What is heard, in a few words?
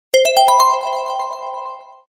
Tunnel, notifications; Meizu MX4